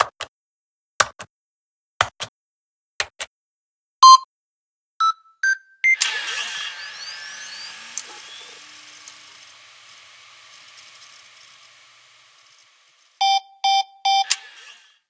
Keypad

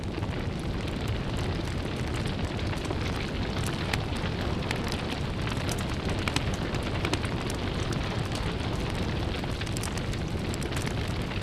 Enemy Burning

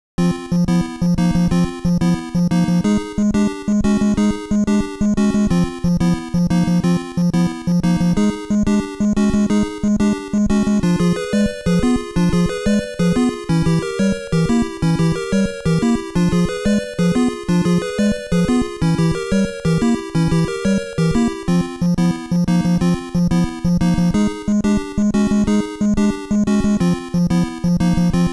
Battle Theme; Zelda II: The Adventure of Link; FDS, NES

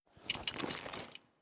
Attack Mamluk Clothes

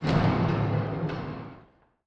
Tank Start Spinning; Waterways

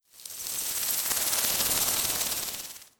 Malediction Insect

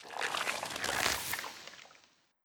Dryad Vermine; Legend of Keepers

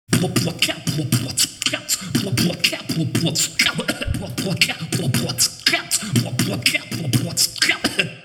beatbox 16 beats; ruins

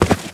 Footstep Walk Base

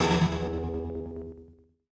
Impossible-Triangle Pizz

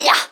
Rogue Attack; Pecheneg

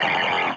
boss parried Voice; unsighted; enemies

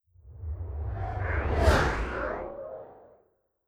Gob Tamb Spell

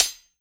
Sword Hit Impact; Legend of Keepers

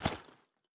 Heavy Armor Grass Walking

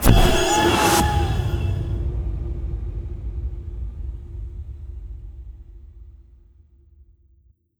Alienation

